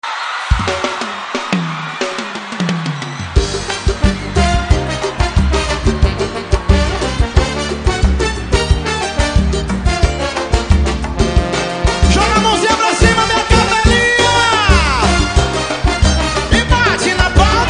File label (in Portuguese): samba